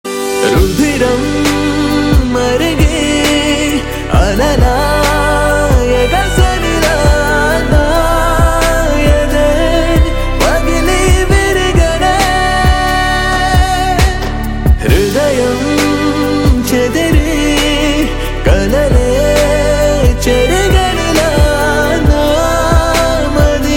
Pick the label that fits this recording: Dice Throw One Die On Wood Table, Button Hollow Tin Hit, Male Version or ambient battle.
Male Version